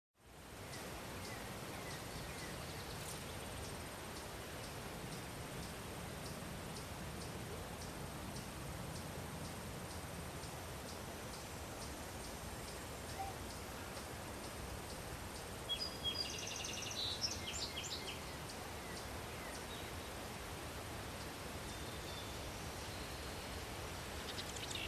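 sounds of village environment, birds chirping, sounds of wind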